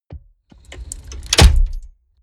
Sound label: mechanics large lock; industrie-wirtschaft; mechanik